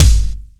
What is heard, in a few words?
crispy bass drum single hit g key